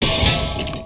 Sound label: Metal Object; destructibles